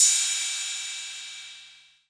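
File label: 808 cym, cymbal, drum machines; 808 Extended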